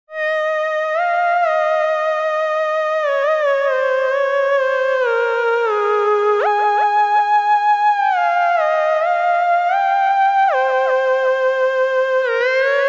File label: Synt orchestra Lead